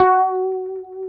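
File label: F#4A, compressed Strat, guitars; Spectrasonics; Hans Zimmer Guitars VOL-1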